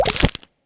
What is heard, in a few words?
Toggle